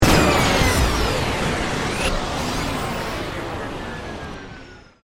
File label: Stinger Hit Descend, Production, Element, Stinger, Hit, Descend, Sweeper, Digital; BlastWave